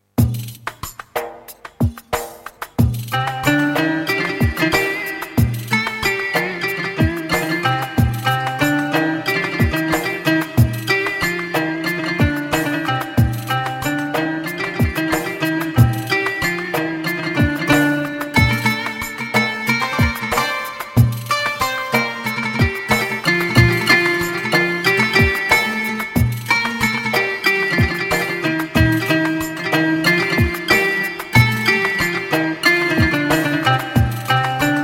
gem wk8 ud kanun

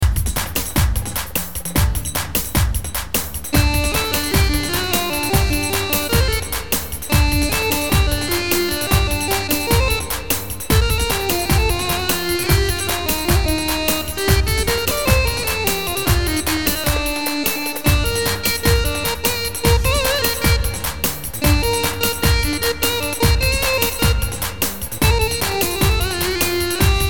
roman, korg pa500 tr roman; kisa

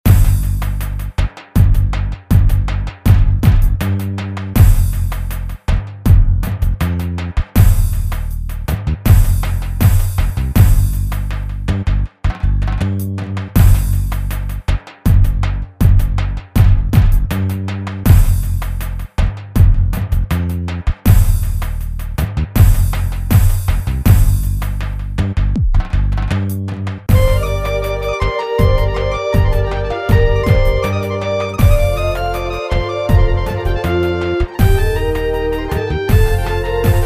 zeybek ritim deneme, gw8 drum kitlerle ritim

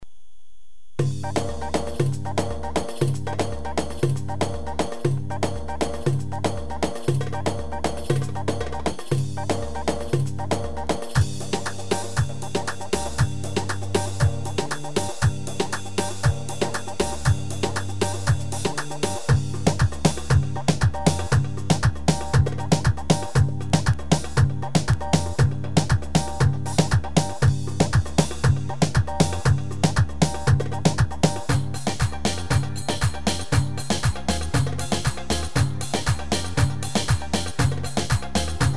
2 4 oyun havasi

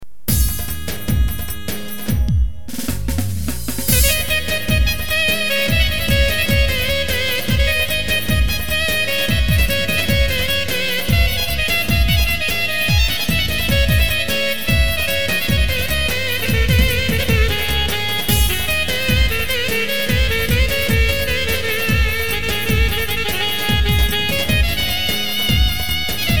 pa 600 roman; tanitim

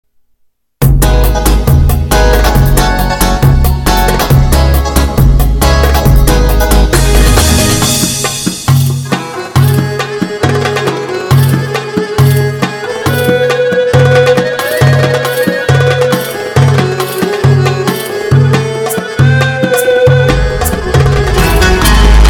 oryantel, roland gw 8 ritimleri

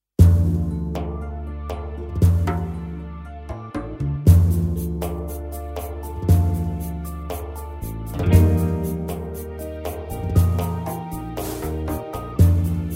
türkümsü, v2 tek ritim

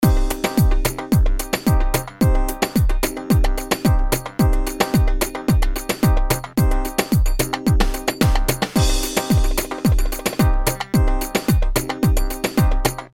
gw8 2-4 oyun; gw8 drum kitlerle fl9 ritim convert

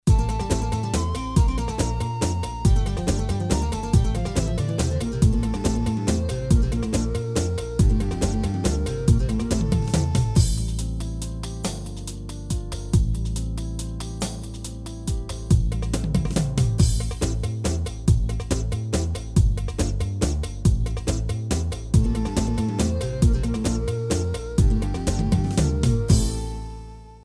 sanat 3 4, yamaha psr a300tr v2 ritm

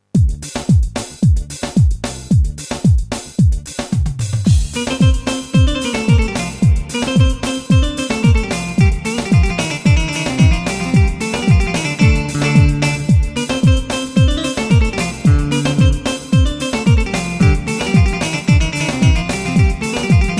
wk8 elektro bam

